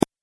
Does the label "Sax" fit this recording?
No